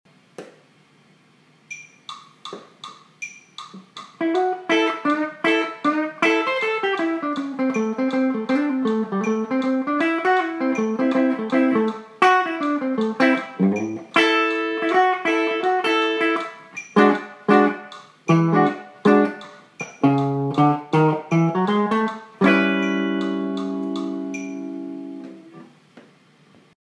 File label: blues 160bpm